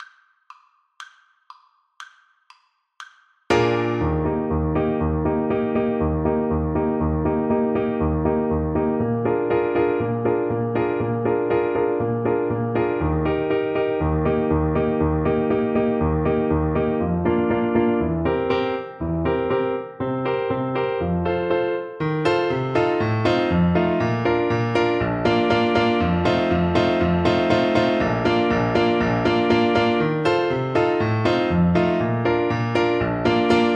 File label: horn, French Horn